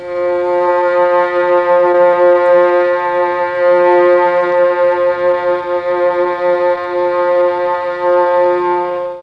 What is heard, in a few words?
F3 300 VIOLA, mellotron, STRINGS